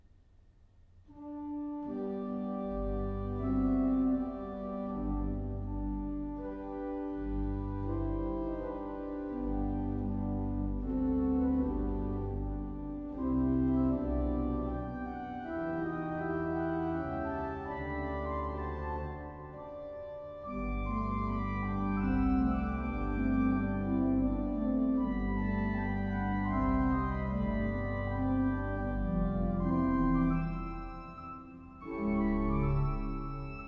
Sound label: Victorian Sonata, Organ Improvisation; Andante